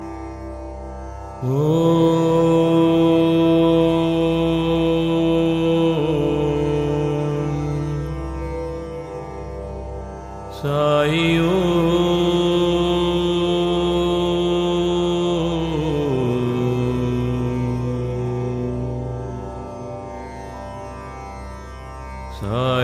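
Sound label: Meditation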